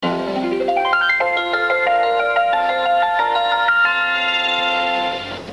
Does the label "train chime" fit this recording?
Yes